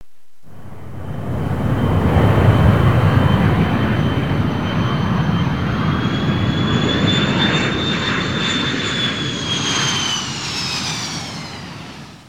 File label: distant rockets; nimbus station